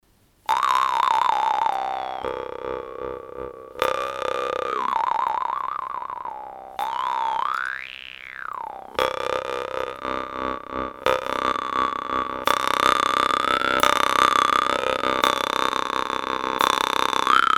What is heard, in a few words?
c1 32,70 hz, harp